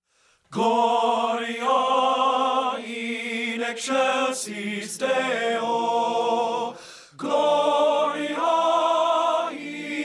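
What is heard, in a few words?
GLORIA IN EXCELSIS DEO tenor; men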